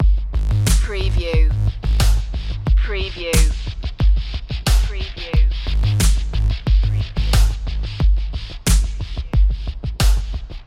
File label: agony loop